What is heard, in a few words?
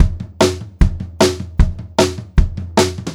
152 SP TOM, Pop-Rock Drumloops; USB Soundscan vol.37; AKAI; 90 s Sample CDs; 152 SPEED